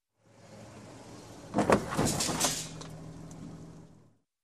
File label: Car Bump with Debris Fallen Sound